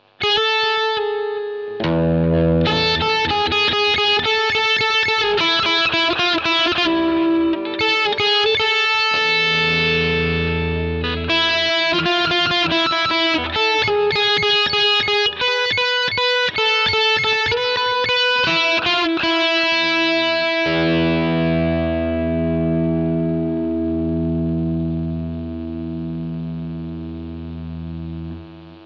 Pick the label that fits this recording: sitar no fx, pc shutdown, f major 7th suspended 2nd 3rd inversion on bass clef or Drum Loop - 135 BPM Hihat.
sitar no fx